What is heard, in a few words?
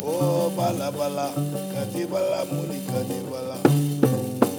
O BALA, SINTIR PH; Spectrasonics; Heart of Africa 2